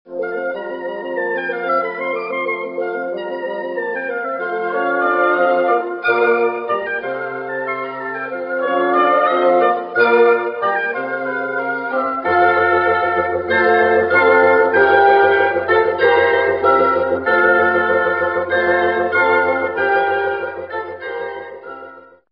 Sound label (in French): hautbois chambre; 1er mouvement de l'Octuor en fa majeur D72 de Franz SCHUBERT